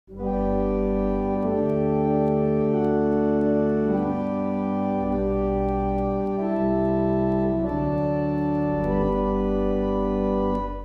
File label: Evening Hymn, barrel organs; Sutton Cambs